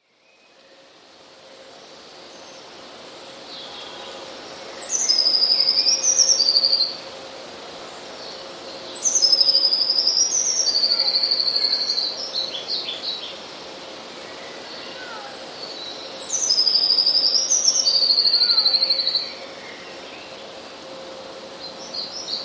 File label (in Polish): spiew; długie tryle